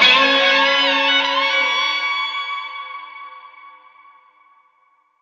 super mega win end; netent

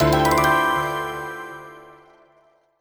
medium Win; netent

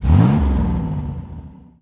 car rev; netent

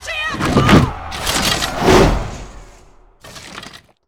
shield wall land; netent

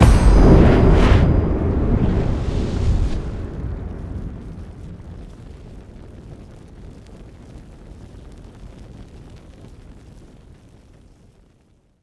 transition From Free Spin Outro; netent

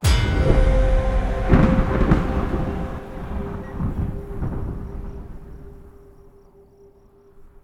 freespin intro; netent

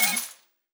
count Up End

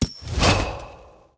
griffin wild attention

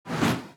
bet Line Smoke

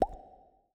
reel bounce; netent

